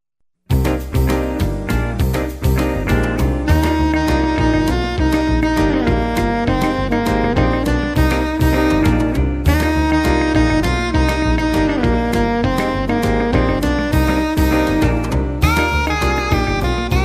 King Wenceslas Jazz

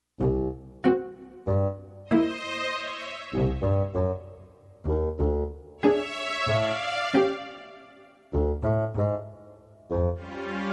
Cartoon Suspense; Album: Suspense Music; suspenseful music; creepy music

